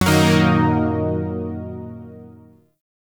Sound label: Yo Chord C, Zariman Shawzin; Music; Warframe